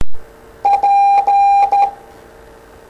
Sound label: Morse P